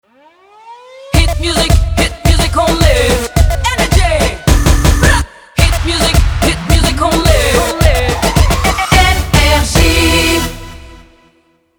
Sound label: jingle; NRJ